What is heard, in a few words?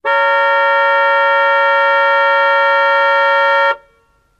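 Car Horn Old Long Blast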